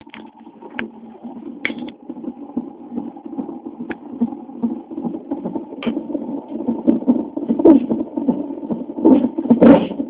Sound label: heat beat